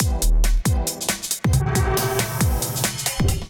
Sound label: Kit Melodic 137 bpm, Drums; MIDI Clips; Sol Hard Kit; Ableton; Factory Packs; Skitter and Step